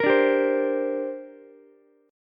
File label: D 7th Suspended 4th Sharp 5th down Ukulele; D7sus4#5 strummed